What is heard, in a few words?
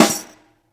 Jingle Snare, Snare One-Shots; Drum n Bass; KJ Sawka; Drum n Bass & Beyond; Drum Tool Box